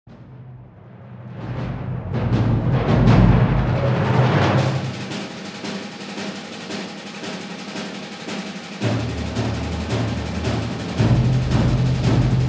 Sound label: sax